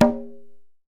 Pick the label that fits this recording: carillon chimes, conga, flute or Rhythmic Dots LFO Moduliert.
conga